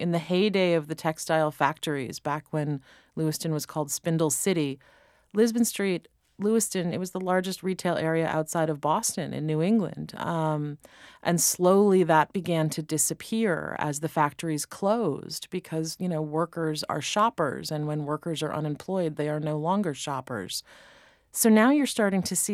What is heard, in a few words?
Bass